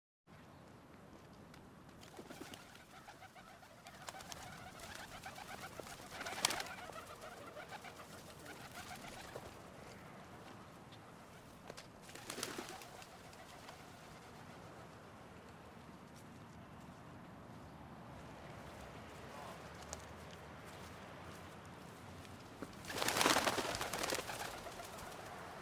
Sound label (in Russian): golubi v parke vzletajut ot zvuka shagov prohozhih